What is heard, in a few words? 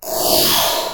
Synth Comb Swipe